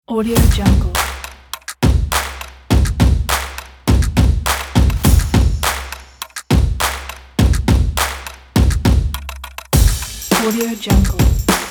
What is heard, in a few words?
upbeat percussion energy promo